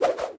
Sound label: Moonwalk Spin; eldorado